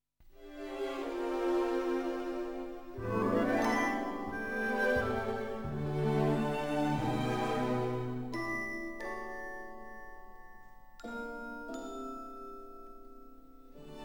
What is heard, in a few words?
instrumental